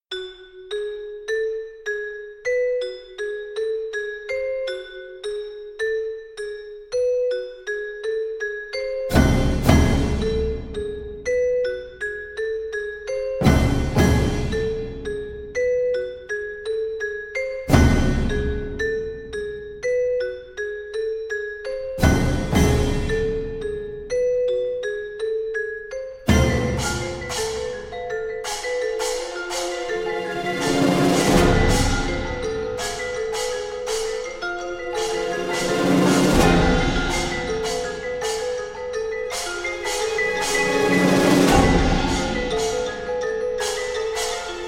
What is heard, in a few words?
Percussion Concerto, Syncopate